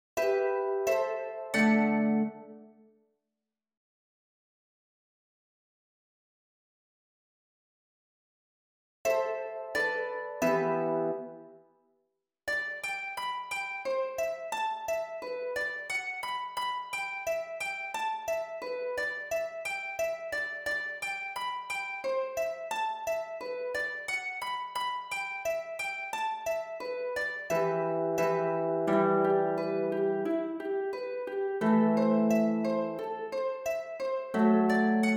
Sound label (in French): HARPE